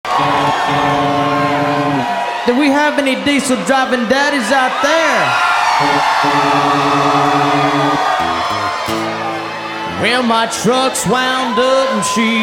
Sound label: diesel, Ford Bronco